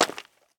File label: stone footstep